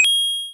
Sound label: pickup, Retro Sounds 32-Bit